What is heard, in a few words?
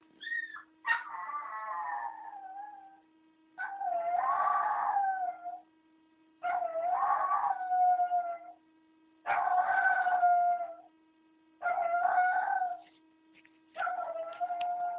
howling